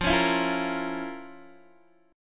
F Major 7th Suspended 4th Sharp 5th F, Guitar Open D